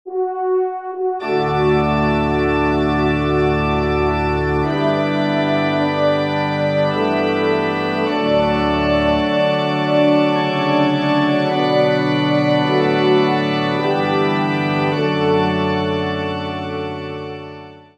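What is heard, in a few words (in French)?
Trompe Orgue; Kyrie; messe cantin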